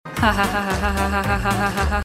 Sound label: laughs; fenneko